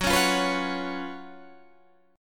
Gb Major 7th Suspended 4th, down, Guitar, Half Step Down